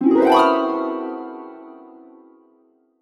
Magic Carpet Gliss; YIIK A Post Modern RPG

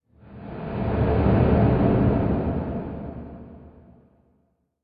echoes; thief 2014 gamerip

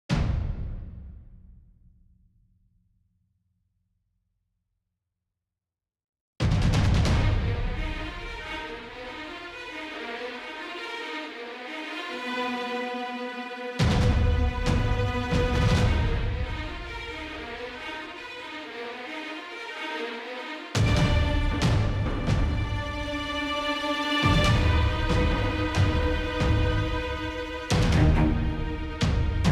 Tic-toc Clock Run; Sniper Ghost Warrior 3 2017

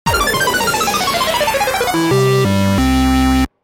Result Eliminated Game Over

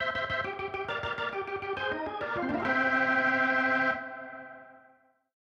organ

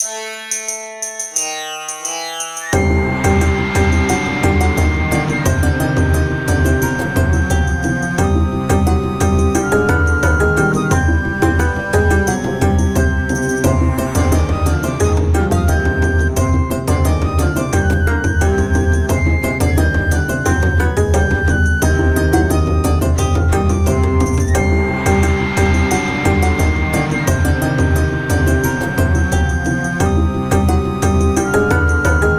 BGM

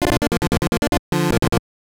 Jingle, gb; Sakata Gorou Kudan no Renju Kyoushitsu; GBC

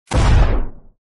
explo

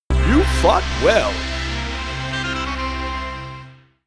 Wins

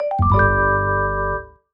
Jingle Point; Clubhouse Games: 51 Worldwide Classics

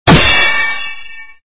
SFX sound (claw hit); Red Baron

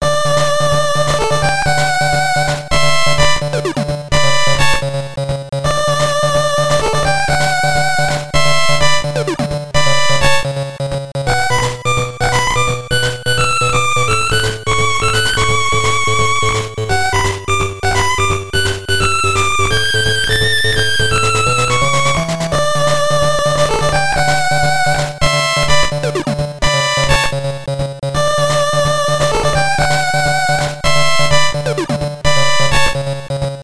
Round Clear; Sagaia; GB

